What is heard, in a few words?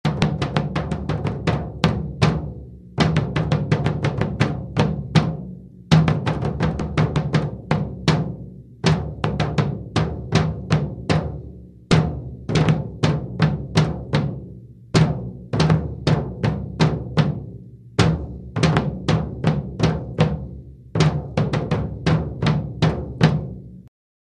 drum